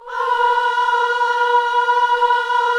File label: KIDS CHOIR, Gospel; Spectrasonics; Vocal Planet Vol.1 Gospel; akai